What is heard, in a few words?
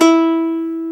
UKULELE E4; AKAI S-Series CD-ROM Sound Library VOL-7